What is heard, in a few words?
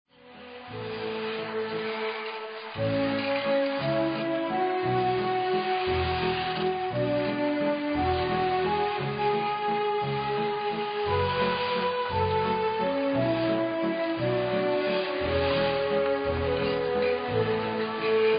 Waltz; Walteufel; Skaters